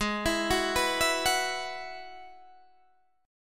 G sharp Minor 7th Sharp 5th G sharp down Guitar DADGAD; G#m7#5 arpeggiated